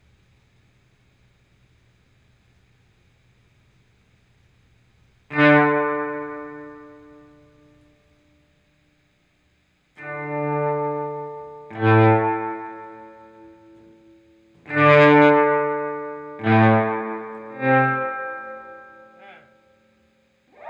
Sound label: guitar volume swell